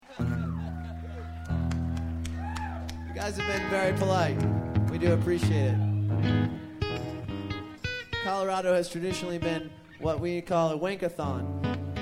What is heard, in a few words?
Acoustic Jam Session